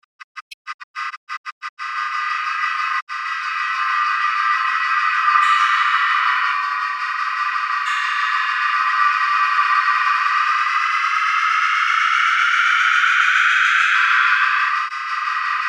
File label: piano